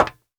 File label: METAL, FOOTSTEPS; AKAI S-Series CD-ROM Sound Library VOL-6